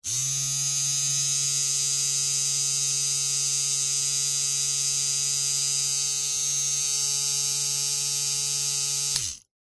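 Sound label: electric trimmer no attachment motor whir buzz; household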